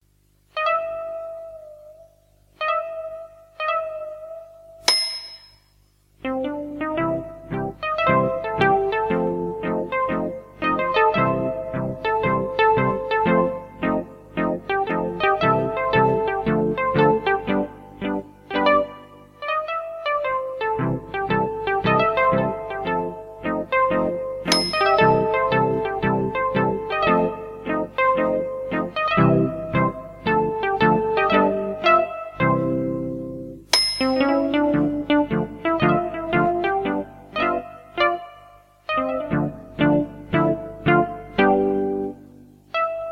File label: Cat Song, cat music; Swiebertje